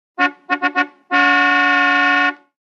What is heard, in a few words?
1950 Car Horn 4 Honks